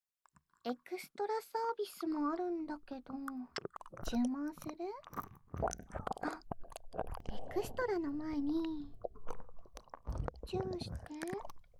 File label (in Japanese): 耳舐めされながら親友彼女にベロチュー・フェラ・パイずりされ、立ちバックと騎乗位でHする音声; 超人気コンカフェ嬢の誘惑。親友彼女のキス媚びおま◯こスペシャルご奉仕裏メニュー